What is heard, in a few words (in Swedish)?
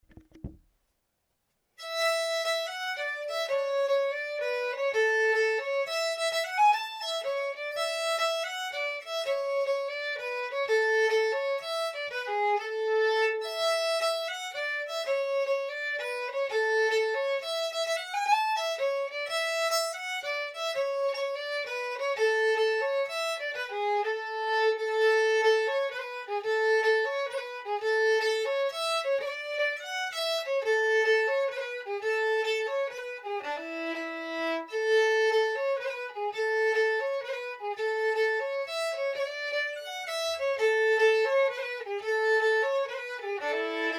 Polska, Svanskog